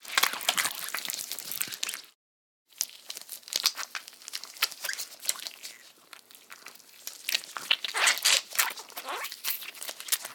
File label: Bloody Squish, Gore; Soundopolis; Gore Toolkit HD